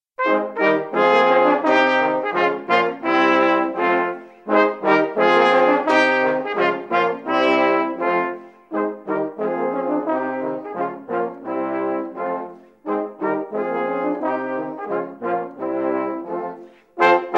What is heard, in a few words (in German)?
Blechbläserquintett